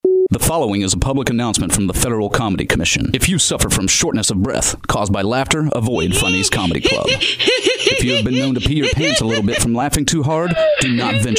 laughs at funnies comedy club

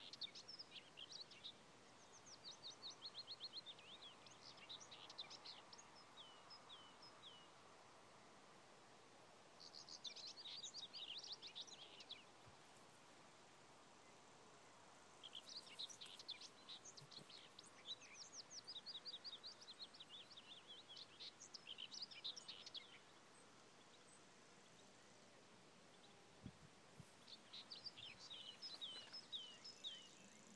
whitethroat and maybe a grasshopper warbler

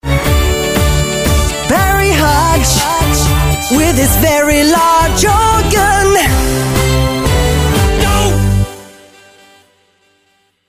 radio jingle

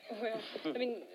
giggle; jim kerr

